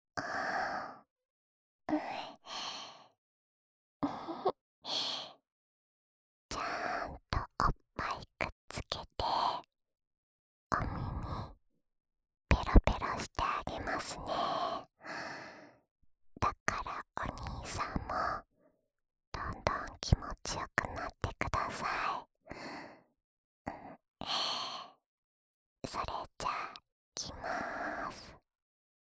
奥の方まで舌先を伸ばして……くちゅくちゅ、ぺろぺろ 左耳の耳舐め, ASMR

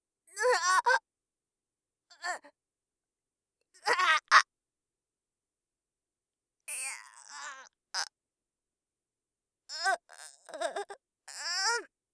プレス機械; 効果音なし

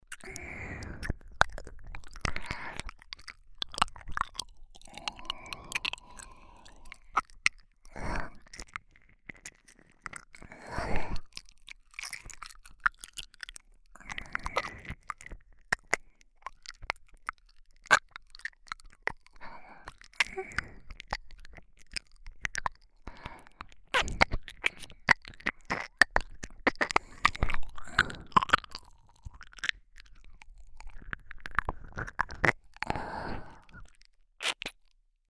耳舐め; ママサキュ

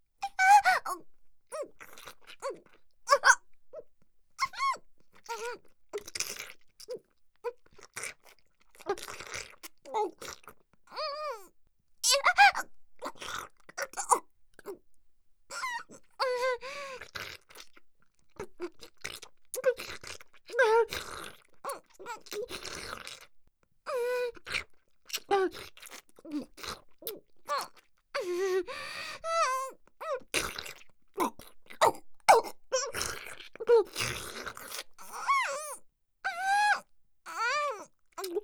強制ディープキス; 本番

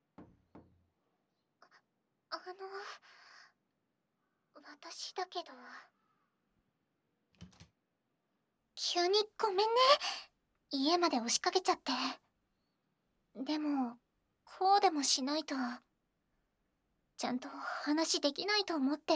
キス、耳舐め、セックス, ASMR; この世界はもしかして…！？

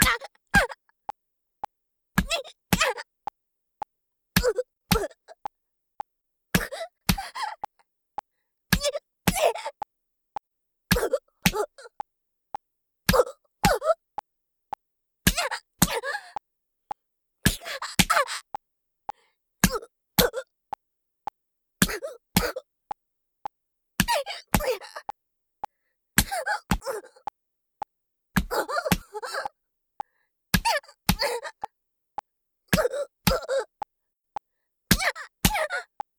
ジャブ メト BPM110 ワンツー, メトロノームあり

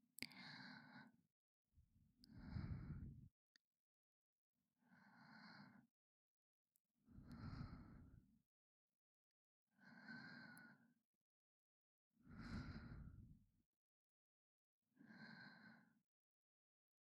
添い寝耳舐め, ASMR

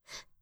ブレスショート

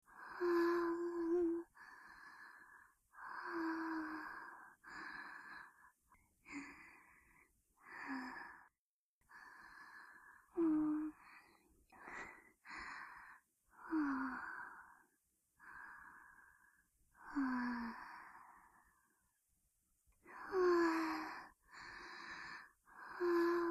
寝息 通常版

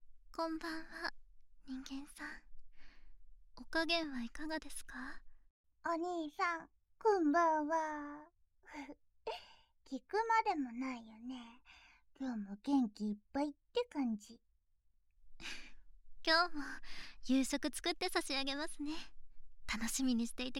姉妹でペロペロ 効果音入り

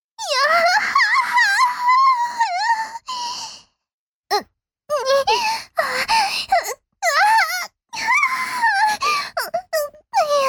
自慰(強)：膣内に挿入する; 実用ボイス抜粋集

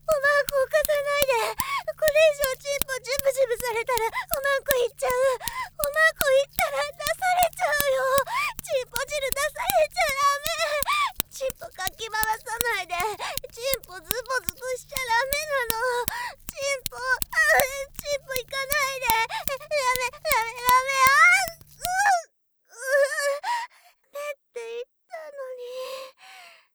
イク; 効果音あり; 単発 〆系

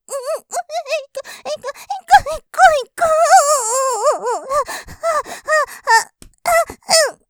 喘ぎ, イク！イク！いくいく！！, ASMR; ループで楽しむ！シェフの気まぐれ抜き抜きコース

